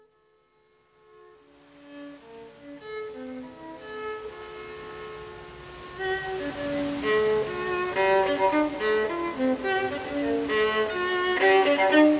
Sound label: Violin in cave; iona